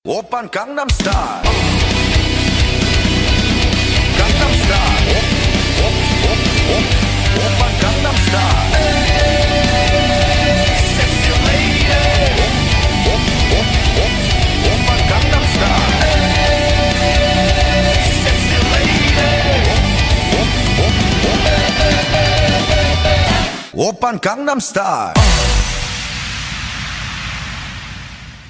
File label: Metal